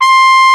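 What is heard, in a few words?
Trumpet mf C5; Digital Sound Factory Virtuoso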